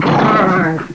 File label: shock trooper die